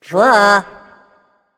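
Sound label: ugh, female; smite; voicepack; hera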